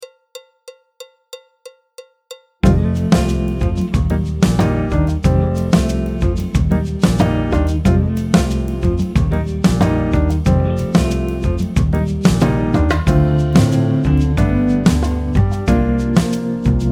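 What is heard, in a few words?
backing track, Jazz; alto, tenor, trombone, piano; Jazz Syllabus Level 2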